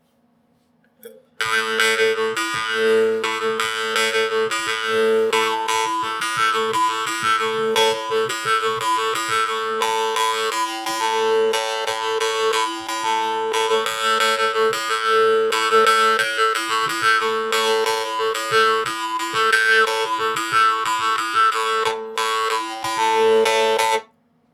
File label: Harp; Kjerringa Med Staven